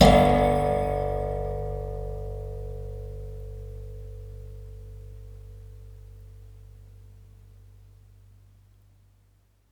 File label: Spring